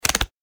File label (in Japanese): カードシャッフル